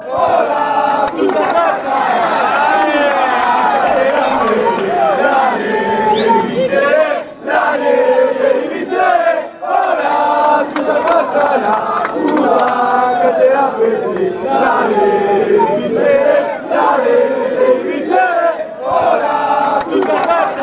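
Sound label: you have to win, football chant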